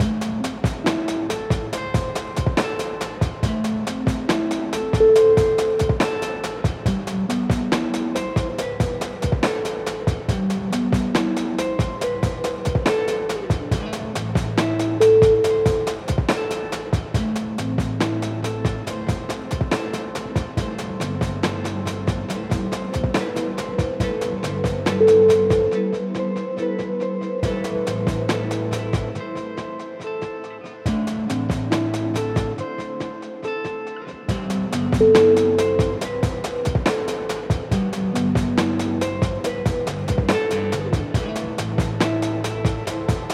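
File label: chill glitch, music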